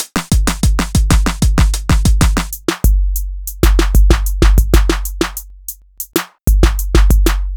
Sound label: 95bpm; GroovePizza